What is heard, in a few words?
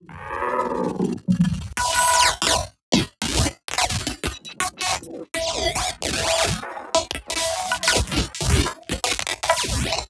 scotch, sound design; base pack